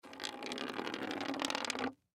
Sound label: Pencil Roll Down Desk; Rolling; Blastwave